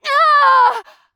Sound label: Falling; Furia; Paladins